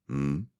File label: hmm